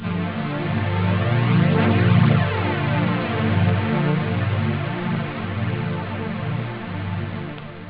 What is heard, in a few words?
flute, synth chords